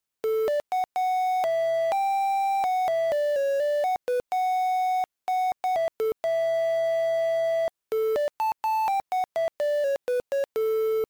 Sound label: unused music; VBWL